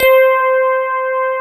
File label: FM ELP 2 C4 R, Keyboards Old School; USB Soundscan vol.09; AKAI